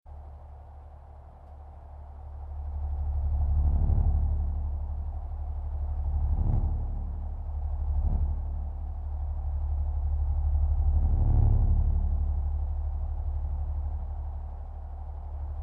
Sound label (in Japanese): noise teion